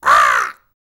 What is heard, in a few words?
TUV BIRD; Spectrasonics Vocal Planet; Jazz FX